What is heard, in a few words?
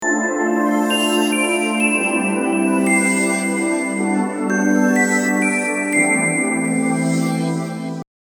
M3 Drizzle